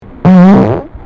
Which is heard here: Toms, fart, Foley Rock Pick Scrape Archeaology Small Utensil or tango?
fart